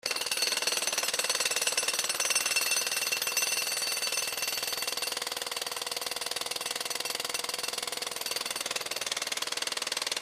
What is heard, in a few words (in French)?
Marteau Piqueur; ecrins; Mars